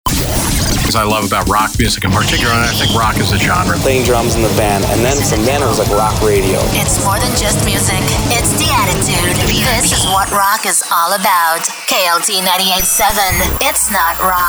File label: ROCK Radio